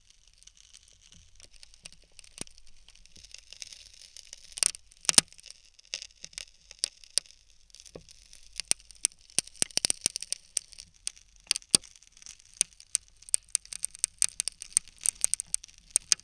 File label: Turning Screw In Hardwood